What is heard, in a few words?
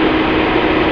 turret rot